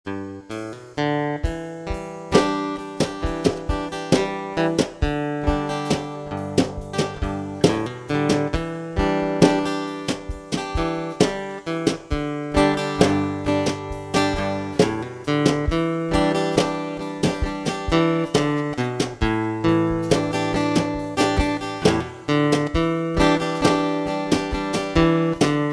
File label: g1 solo mix, acoustic